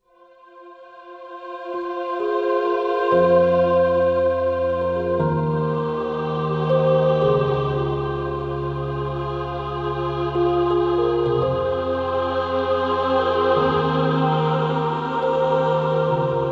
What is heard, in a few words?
transition